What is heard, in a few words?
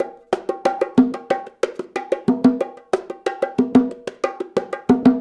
Call And Response Bongos, 70s Analog Funk; Sonic Foundry (Sony Creative Software); What it Is!